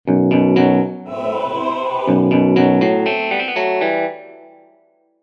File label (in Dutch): Gefluister